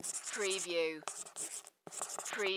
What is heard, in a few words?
Dry Erase Marker Write; Sound Effects Bible